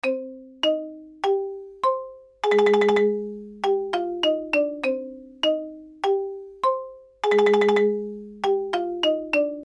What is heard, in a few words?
Xylophone Sneaky, Xylophone Sinister Sneaky Melody Loop; Mallet Sound Effects; Musical; Cartoon; Blastwave